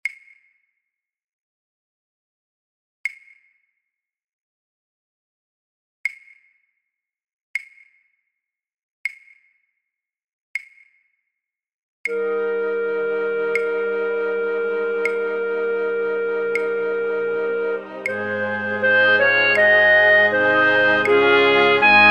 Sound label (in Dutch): fluitorkest Fluit; Matthaus Passion